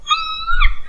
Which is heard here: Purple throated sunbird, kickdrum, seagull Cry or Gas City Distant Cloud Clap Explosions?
seagull Cry